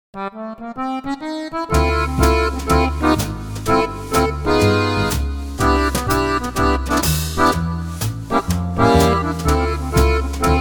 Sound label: swing